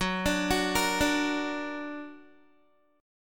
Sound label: F sharp Augmented, down, Guitar; arpeggiated; 4E,4A,5D,5G,5C,6F